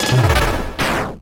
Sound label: Empty Gun; Undertale